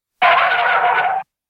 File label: Skid